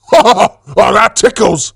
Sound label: hurt; tf; saxton hale